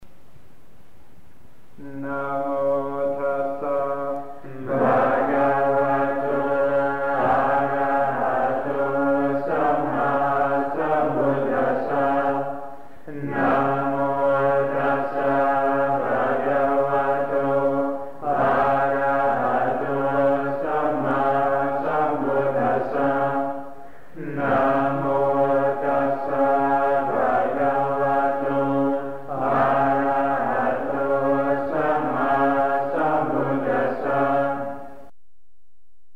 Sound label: chanting